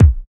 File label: original kick D sharp key; kick drum samples; one shots